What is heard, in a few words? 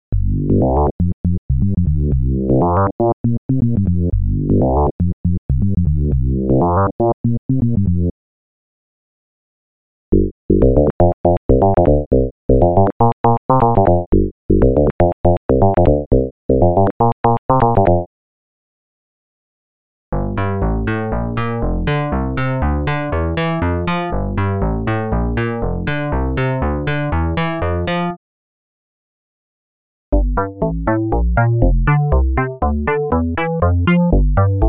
bass